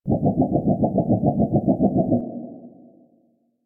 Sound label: Rail Jack Ambient Machine Loop; Warframe